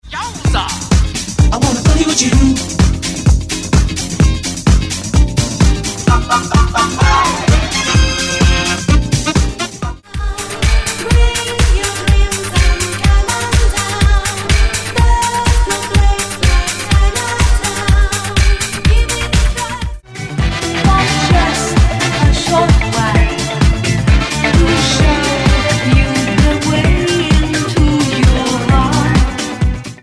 Late 70s Early 80s Disco

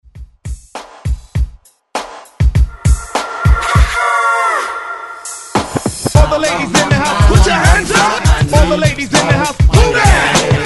Throwback Remix 100Bpm; DJDOLLS

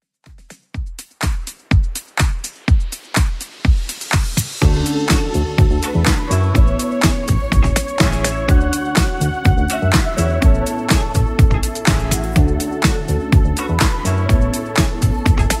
80s Club 124bpm

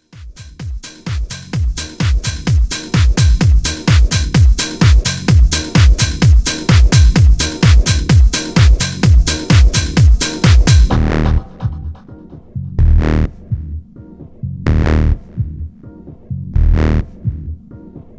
House, 128 bpm

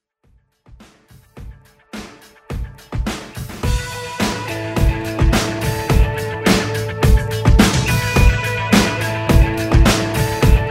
80s redrum

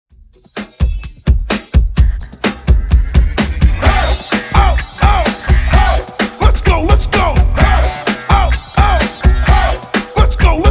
Hype 128Bpm

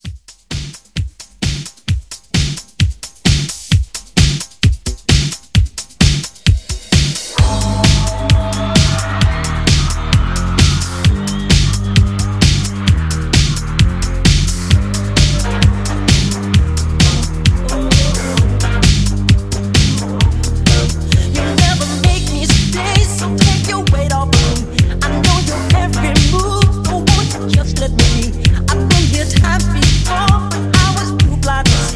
80s Pop Re Drum 131

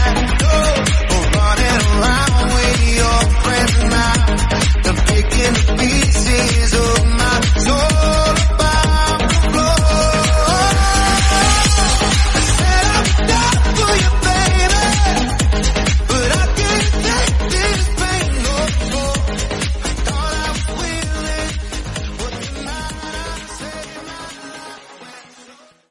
128 bpm Abm

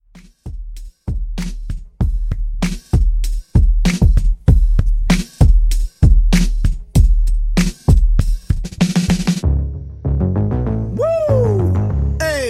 Hip Hop Redrum; Remixfreakz

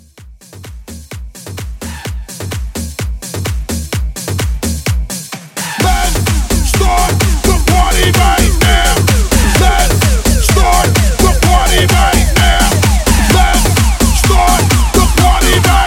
Hype Reboot

